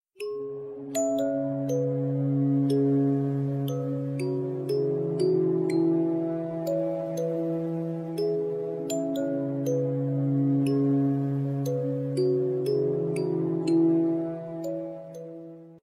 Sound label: tranquil notes